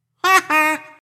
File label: haha